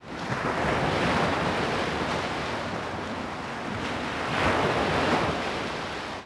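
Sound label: surf loop; Star Wars Obi Wan; XBOX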